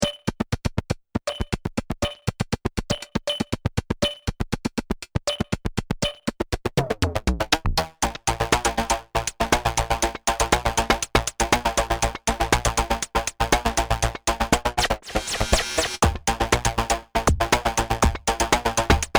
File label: modulargrey monster